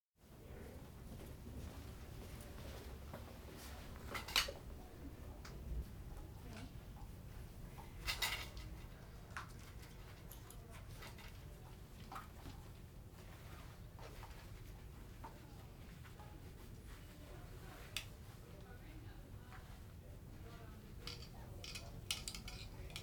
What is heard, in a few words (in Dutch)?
Bloeddruk Wordt Opgemeten; KMP Film Video Sound Effects